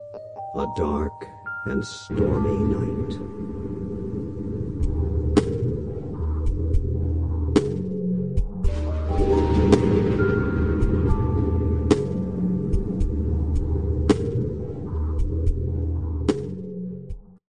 dark delay wet

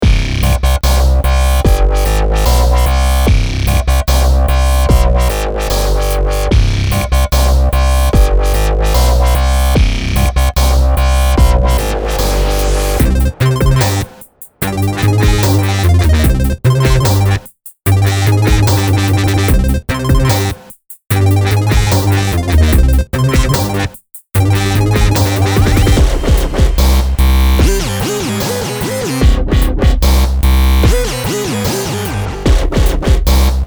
dubstep

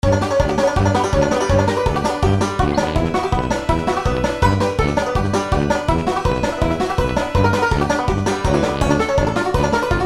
front porch breakdown

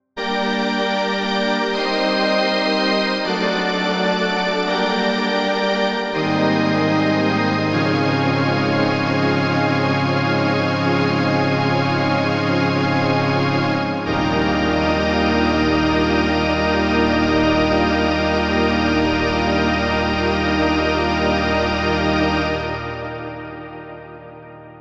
strings halled; stringer

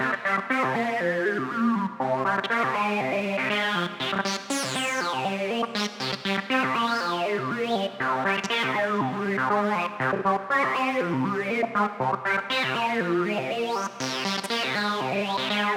melodic enchantment

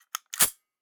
gun cock metal slide; nova

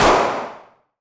firearm gun shot pistol fire

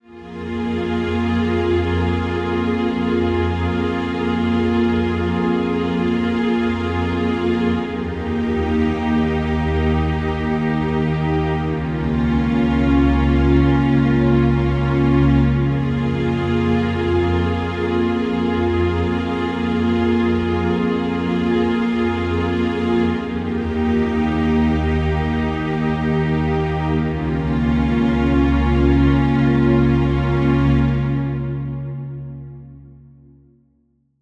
cinematic textures and drones

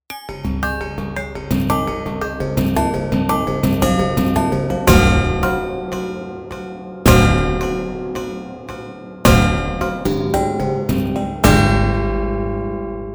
sick saws overview

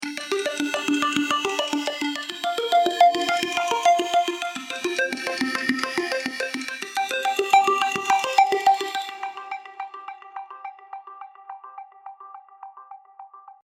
serum seqs arp; chiqui audio

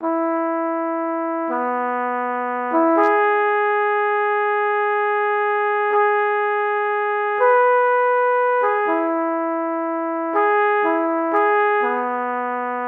flugelhorn legato

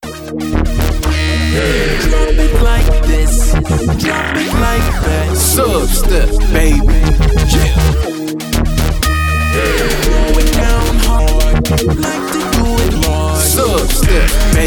substep

